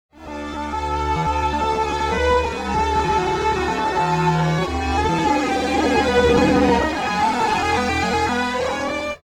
strings; pitchshift

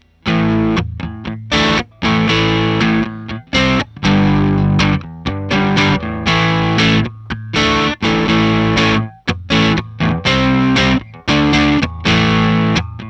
crunch strat; egnater

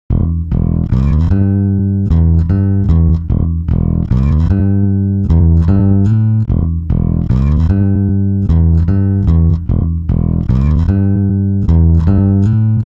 bass; usb uvi workstation; timestretch

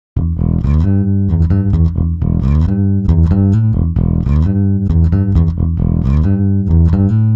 bass 75; i3 dsp quattro x; timestretch

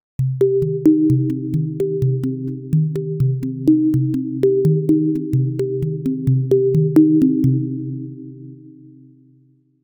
collision bells; ableton live8

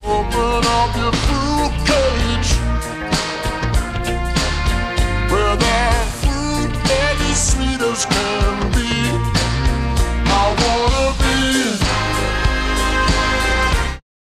Serato Pitch 'n Time Pro pitch shift -5

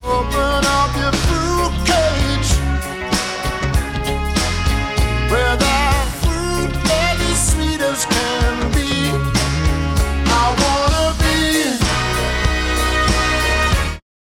Serato Pitch 'n Time LE pitch shift -2

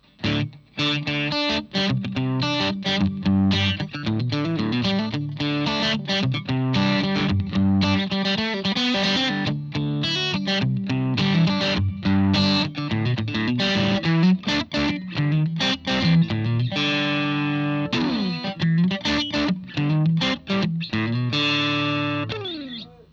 stratocaster; fender new standard guitars

